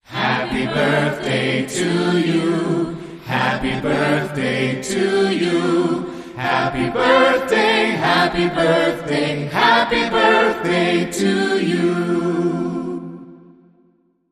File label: Acapella Happy Birthday